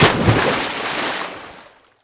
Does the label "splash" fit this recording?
Yes